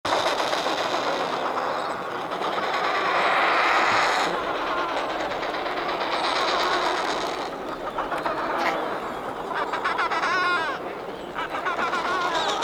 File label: Adelie Penguins Cape Adare; Ross Sea 2025; expedition blog calum turner